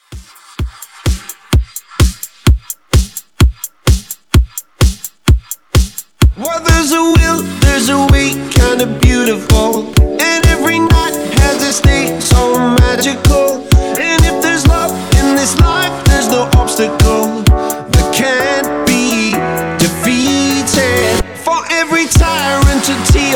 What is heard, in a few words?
128 Bpm; Redrum